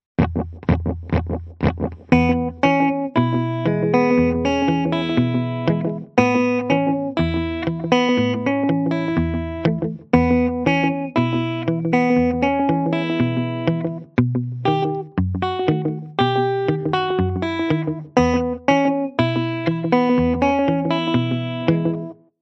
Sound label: blues